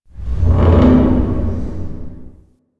Metal Flex, Ambience; Warframe; Corpus Railjack

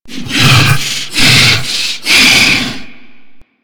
Grineer Hammer Boss Idle, Vocals; Warframe

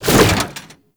Infested Hammer Idle Whoosh; Weapons

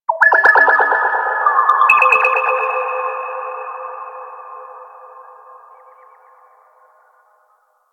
Mine Drone Underwater Idle Vocal

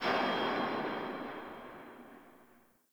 Under City Alley Distant Mechanical

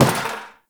Destructible Bucket Impact; Warframe; Ambience; Nineteen Ninety Nine

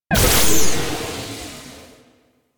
Glyph Prism Blind; Warframe; Duviri; Glyph Puzzle

